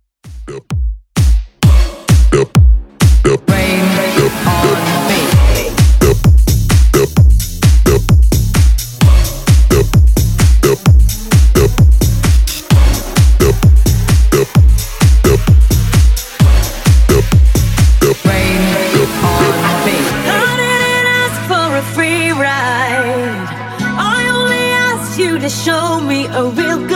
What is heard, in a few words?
Bootleg 130bpm